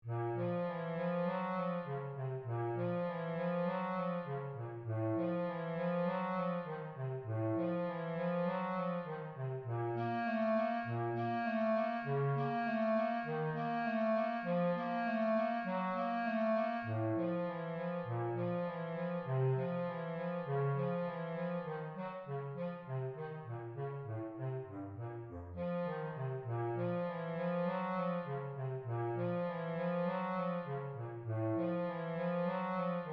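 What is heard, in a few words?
Bass Clarinet Contra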